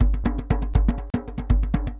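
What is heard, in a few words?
120 DEEP DR, drum; Best Service Advanced Media Trax Modern Composer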